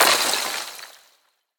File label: Shatter Sweetener; Boomerang Fu